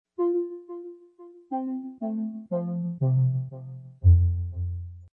Power down; Motorola SLVR L7 iTunes Cingular

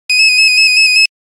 Nokia Buzzer Mosquito; Windows Phone apps

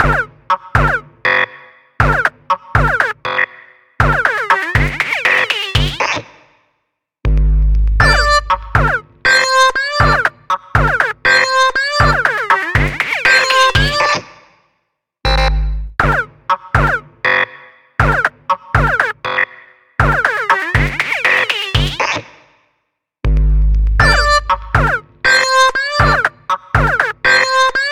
Laser, ringtones; LG F520L AKA

